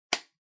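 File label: Sensu Close, folding fan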